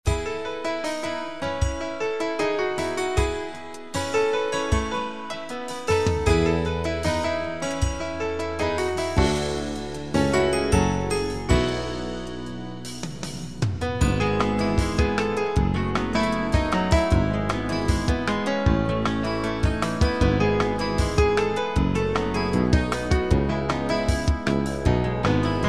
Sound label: Light Rock